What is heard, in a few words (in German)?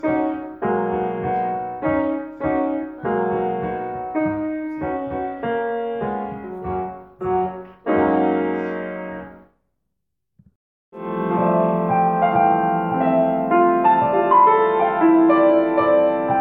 Pitsche patsch Improvisation